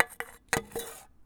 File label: Small Can - Drag on Tile; Micro Pack